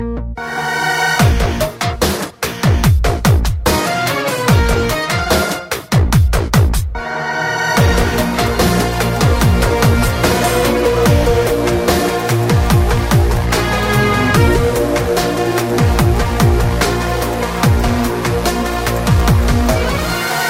best music tone

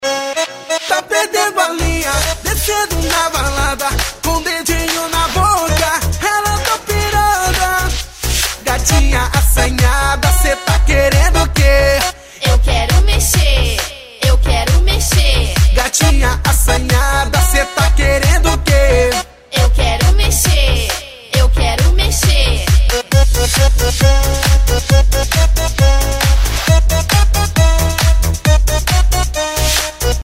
remix

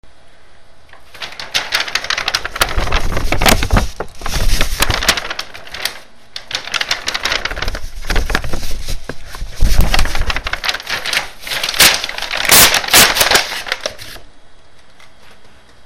crumpling bag

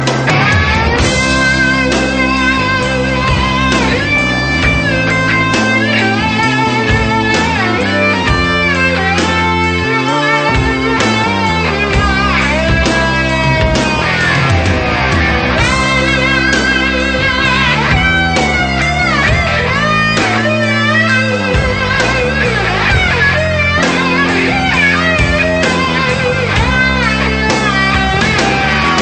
amazing guitar solo

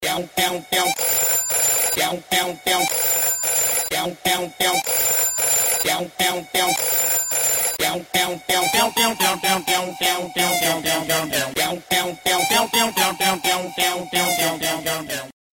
ringington

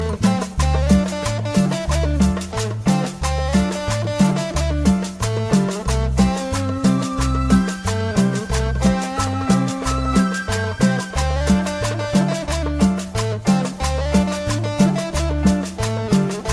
cumbia con valz

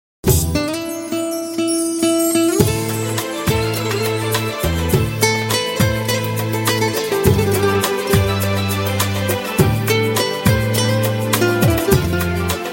romantic turkey guitar

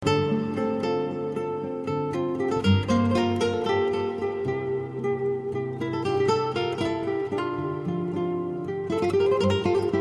guitar

